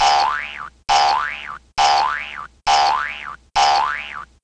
boing x 5

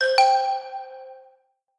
achievement geometry dash

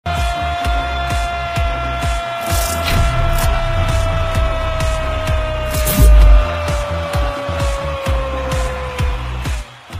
goal song; as monaco

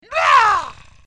clockwork choke

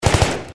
m16a3 burst inside

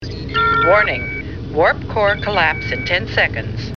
warning warp core collapse

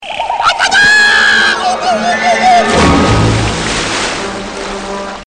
screaming; jar jar

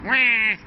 waaaaaa

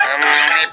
hum bleep

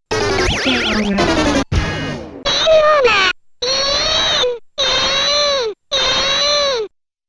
game over; vib ribbon

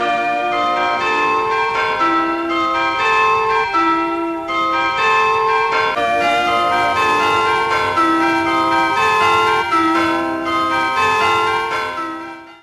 happy bells sfx; disney

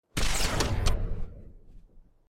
beam rifle shot

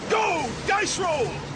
dice roll

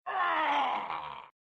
warrior death

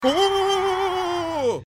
huwoohooohooohoohoooohohhuhhhh

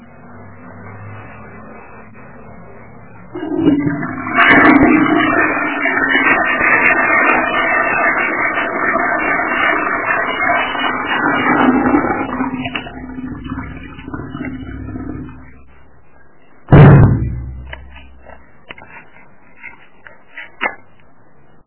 vurucahrrarararraaruummm boommmm

